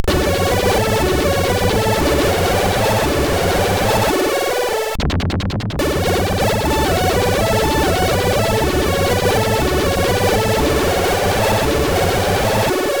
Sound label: Sad Tune; SIDTunes